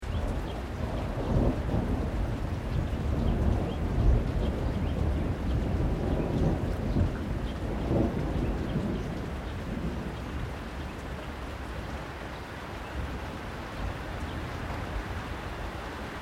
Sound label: THUNDER DISTANT LOW LIGHT RAIN BIRDS; Elemental weather effects; Thunder Sound Effects